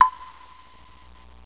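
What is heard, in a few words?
WOODBLOCK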